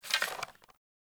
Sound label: Hunter Bow Ranged Charge; Weapons; Player; Bows; Envoy Hunter Bow Ranged Melee